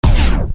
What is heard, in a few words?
tape stop